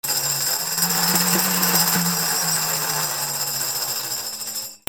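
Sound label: percussion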